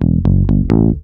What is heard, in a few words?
BEL; USB Soundscan vol.32; Roots Disco; AKAI